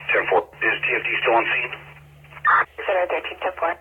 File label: death; Ready Or Not